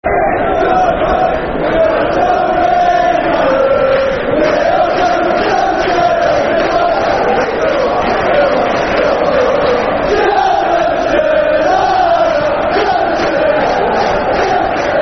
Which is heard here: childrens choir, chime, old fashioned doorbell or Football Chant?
Football Chant